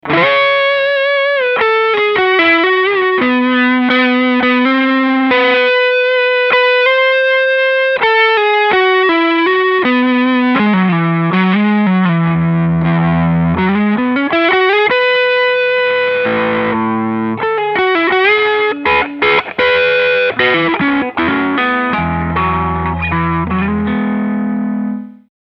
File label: Maximum Gain